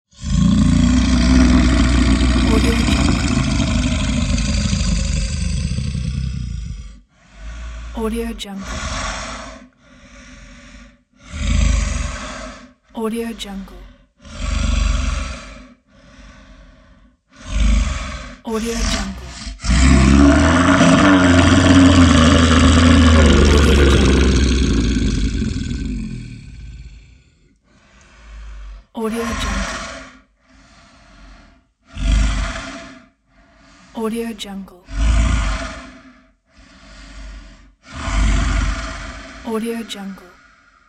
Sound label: bear creature growl breath